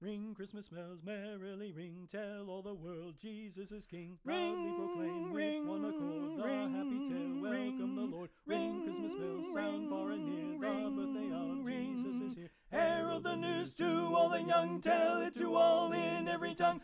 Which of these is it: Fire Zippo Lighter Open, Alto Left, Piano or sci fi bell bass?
Alto Left